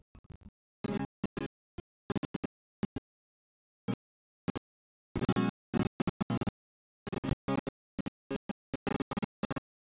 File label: nodrums inst sim; SASSEC07